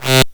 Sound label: blips, opl3